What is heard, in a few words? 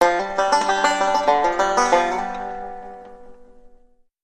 Banjo Lick